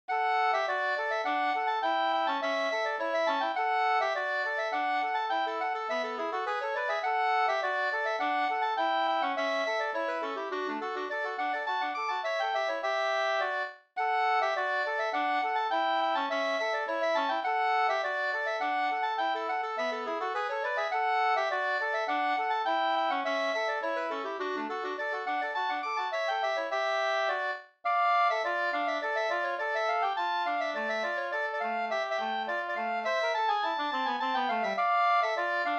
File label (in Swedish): slangpolska, D dur